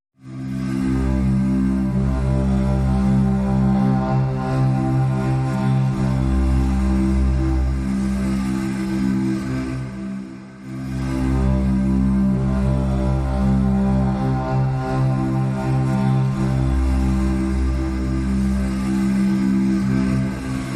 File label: Foggy Wood Winds